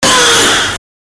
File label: gag